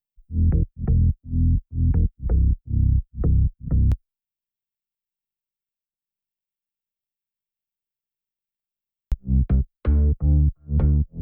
Bass

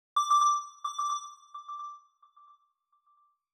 Beeps; ActivStyle effects

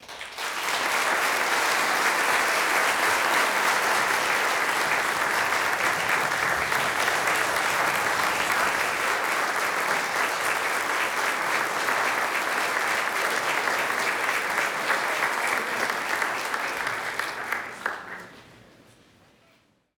Applause

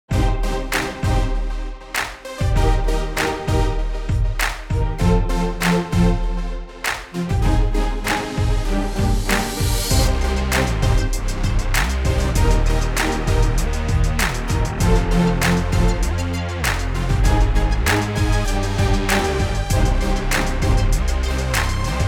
Victory Lap; Copper Fit X

